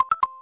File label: jingle